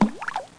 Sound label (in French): plouf; Emme Arc En Ciel Et Le Lagon Merveilleux